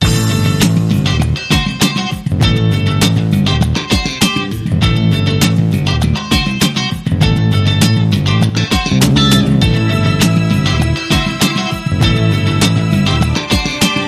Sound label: Funkygroove